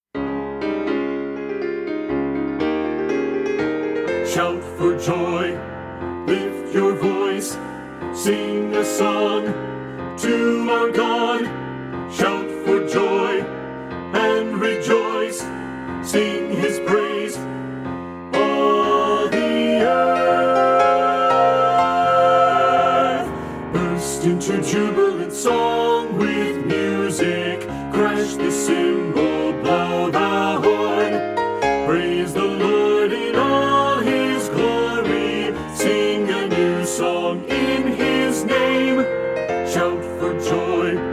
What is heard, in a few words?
Shout for Joy BASS